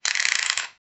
rubiks cube rotation sound

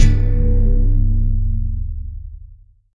reggae bass strum stacked

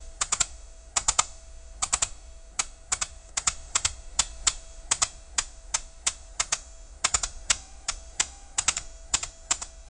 two light weighted robots

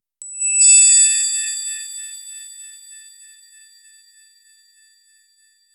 magical healing pickup

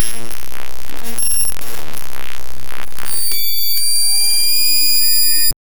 a weird sound that glitch

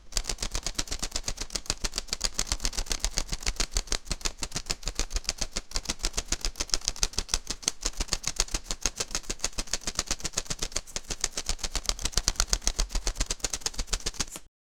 cards

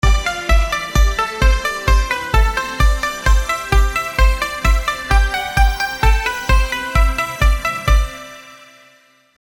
meloda de msica house, house music

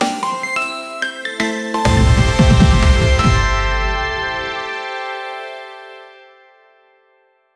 a bright punchy news jing

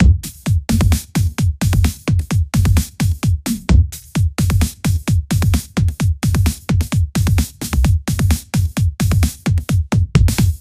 drumm and bass drumm

